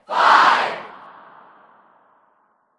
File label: Tennis Crowd